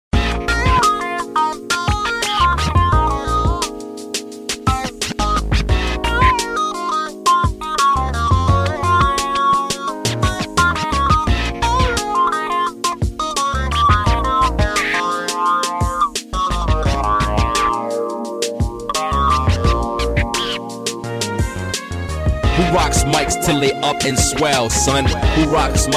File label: Break Meeting; 1bitHeart